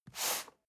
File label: cardboard box medium slide short; objekte